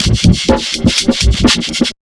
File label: loop, Big Beat Drumloops, electro 125; USB Soundscan vol.25; AKAI